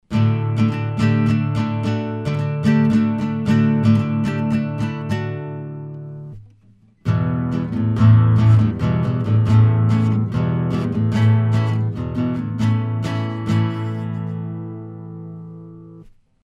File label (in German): Rode Broadcaster Gitarre